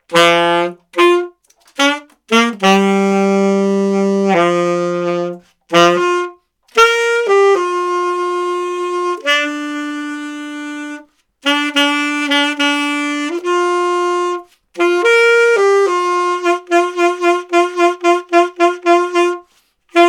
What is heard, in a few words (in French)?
rencontre providence au saxophone